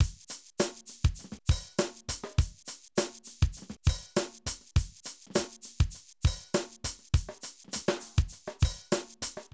Drum loops, 70s funk

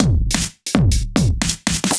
Kit 2 Heavy Swing 120; off the grid

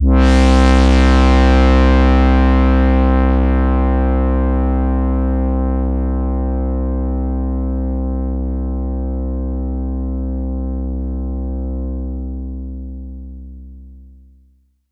pad C2, Moog Minimoog; Pads

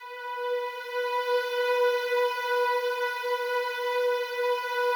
slow strings B3, Yamaha VP-1; 90 s Sample CDs; Music Store

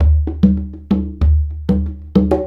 Congas; Essential Percussion

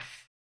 HAMBONE, Vocal Blues Soul; Spectrasonics; Planet

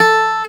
GTR SOFT A3, Celtic Flavours; USB Soundscan vol.42; AKAI

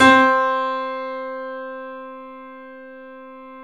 3D Pianos, Yamaha C7; E-MU Producer Series Vol. 5

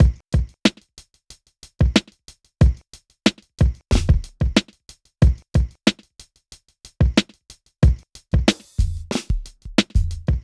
Drums, Hip Hop

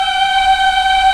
VOX African; Roland LCDP11 Africa VOL-1

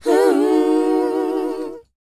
WHOA C#, Vocal R&B & Dance; ILIO; Vocal Planet

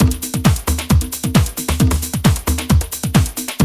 Loop, Roland; Sound & Vision - Gigapack I

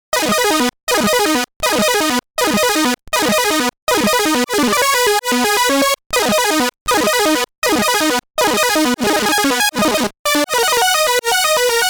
arp

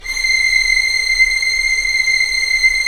STR Violins, Roland LA Composer; Roland - LA Composer vol. 4

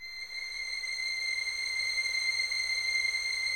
C 7, 11 Violins, Soft; Miroslav Vitous

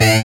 SYN OLD, dance synth; Sound & Vision - Gigapack I CD 2

